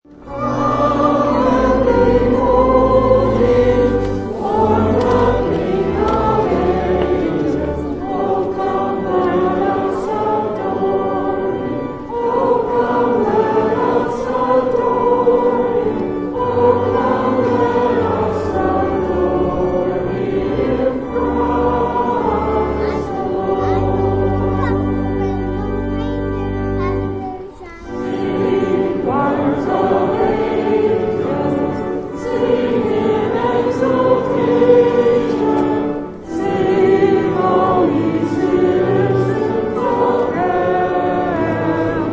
Congregation sings Oh Come All Ye Faithful; Oh Come All Ye Faithful Hymn; Churches

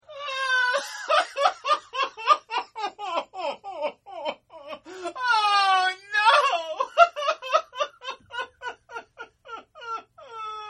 Crying Male; Voice

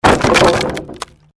created wooden barrier smash medium

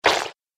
App Game - Sticky Splat; Splat Sound Effects

